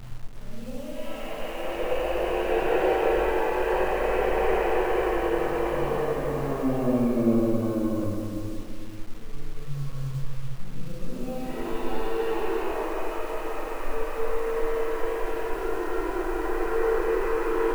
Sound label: baby cry